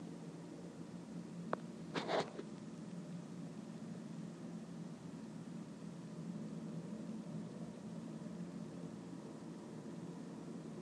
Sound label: israeli helicopters on christmas